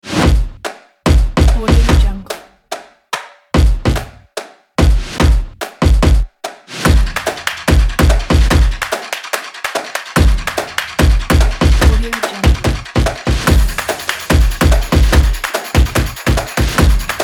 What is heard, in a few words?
drum kit